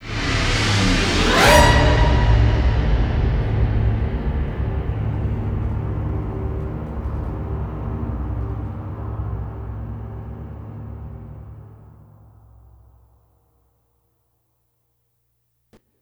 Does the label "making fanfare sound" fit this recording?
No